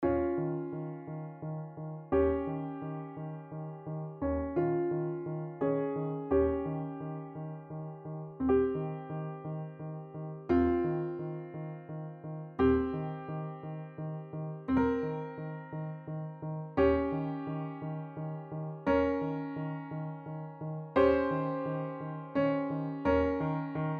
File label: piano song